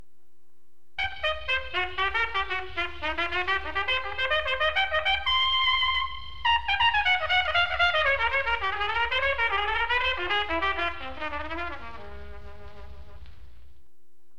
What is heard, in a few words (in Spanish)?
trompeta; instrumentos